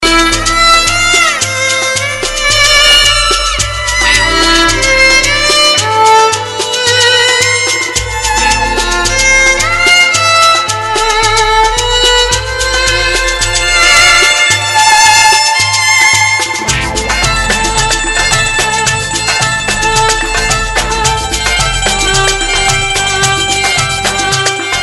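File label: sad violin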